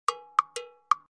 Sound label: Percussion, notifications; Coolpad; ESurfing-No1-2021